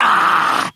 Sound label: "ah" gag; ooh ahh